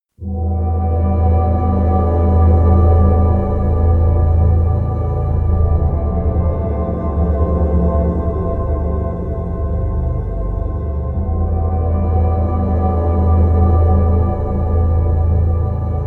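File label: distant winds, pads; flaechen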